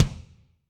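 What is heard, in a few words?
Kick Movin Air, Alesis D4; Kik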